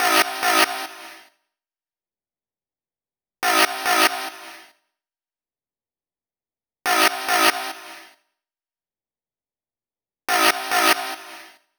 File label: FX Vocal Guy Lead Synth, 140 BPM; Vengeance Samples; VTDS2 Song Kits; FX Vocal Candy Guy